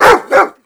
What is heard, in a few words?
animal dog bark